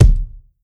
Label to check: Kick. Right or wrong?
Right